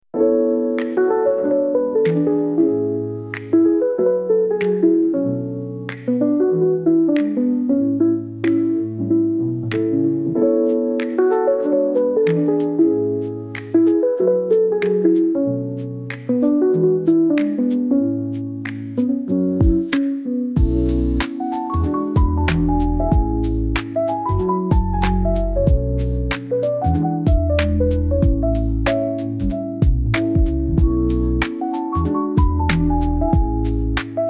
lofi beats lofi christmas beats chill by the fireplace